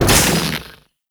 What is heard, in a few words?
Hits Console; minigame; boss; Warframe; Caliber Chicks